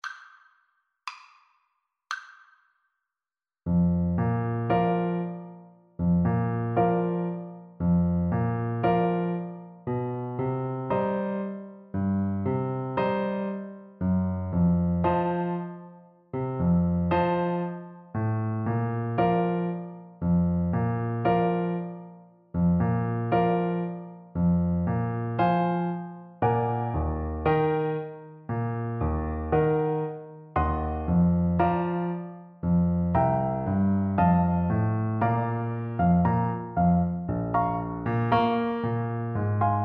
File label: por, Tuba